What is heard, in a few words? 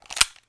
Mag In